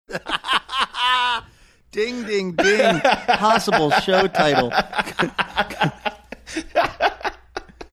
both laughing saying ding ding ding

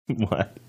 quiet laughing what

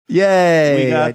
yay

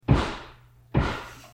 banging sound

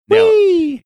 whee

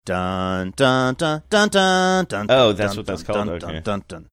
singing also sprach zarathustra

